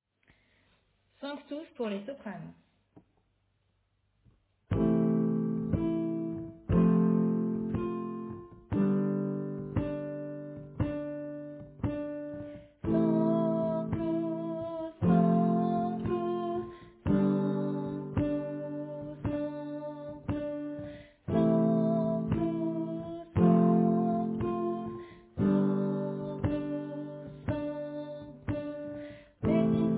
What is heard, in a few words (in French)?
Sanctus Soprane